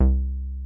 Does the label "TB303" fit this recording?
Yes